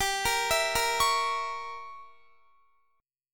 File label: G Diminished 7th, Guitar; Gdim7 arpeggiated; x,22,20,21,22,20